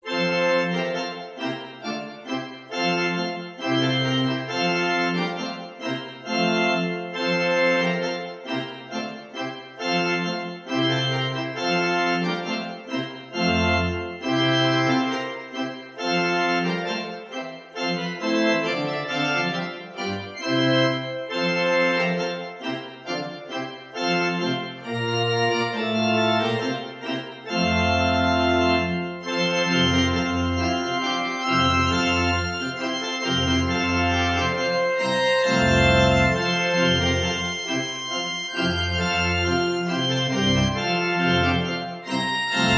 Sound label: church organ; deck the halls